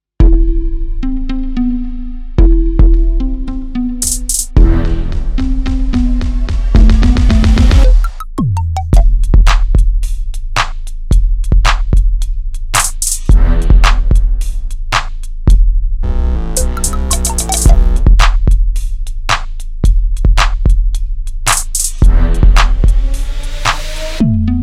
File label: HIP HOP BEAT